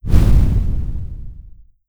explosion; NIIIEMAND's explosion sfx

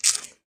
bug; creature

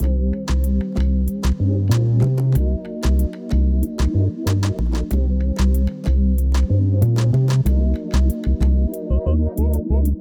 Wibbly Loop; BB 2HTC Samples Vol 4

